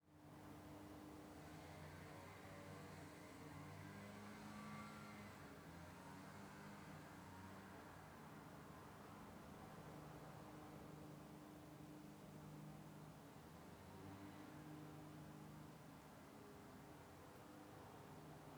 Weed Wacker Lawnmower Distant; Fairlight Sound Lib

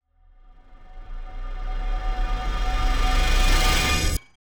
Riser

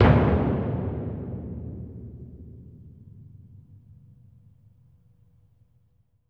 Concert Bass Drum; Percussion; Cymatics - Infinity Beta Pack 2.0; Zeus Percussion Collection

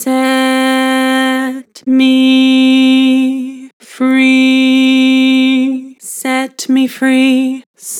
Vibrato, Vocals; Harmor Vocals

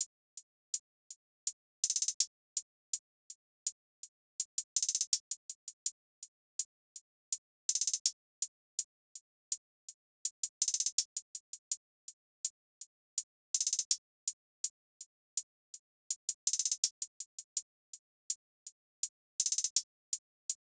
Hallway Knock Hihat Loop - 82 BPM

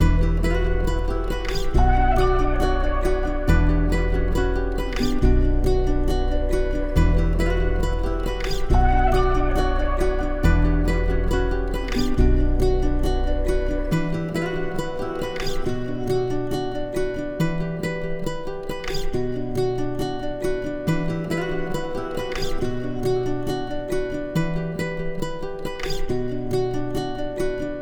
138 BPM B Min, Melodies; Nepal; Cymatics; Immortal Production Suite Beta

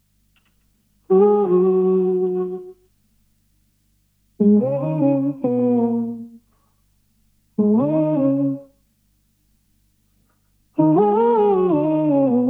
152 BPM B Maj Vocals, loop stems; Cymatics; 2022 Melody Collection Platinum Expansion - Pt 1; Forever

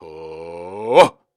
Grunts and Chants, Viking Vocal

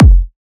Kick, Techno, One-Shots, Drums

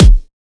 Kick, Dubstep; Dark Matter

